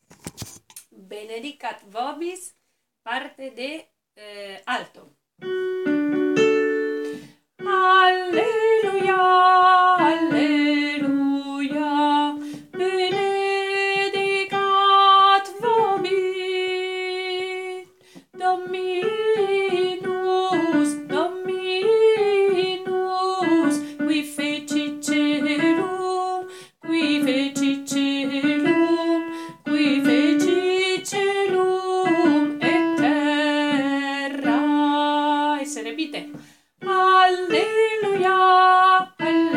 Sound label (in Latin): Benedicat Vobis Alto